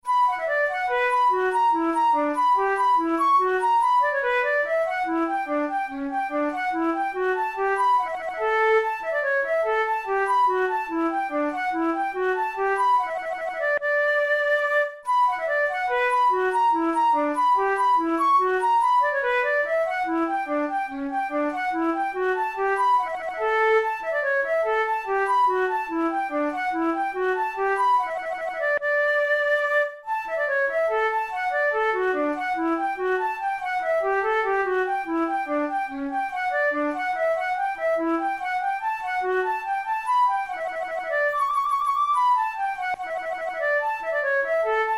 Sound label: braun pieces sans basse no10 fantasia, flute